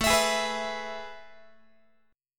A Major 7th Suspended 2nd, Guitar; AM7sus2; strummed

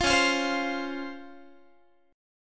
C sharp Major 7th C sharp Guitar; C#M7; strummed

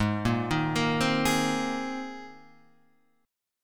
C Minor 7th Sharp 5th Ab, chord

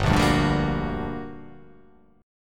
G Minor Major 7th G; chordshape; down

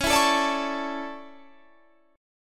D Major 7th Suspended 2nd

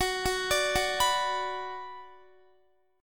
Gb Suspended 4th Sharp 5th Gb, down, Guitar; arpeggiated; JGuitar

